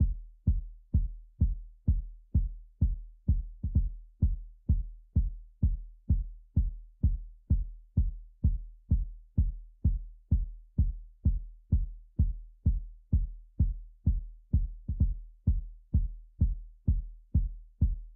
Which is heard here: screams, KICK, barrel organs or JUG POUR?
KICK